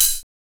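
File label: hi-hat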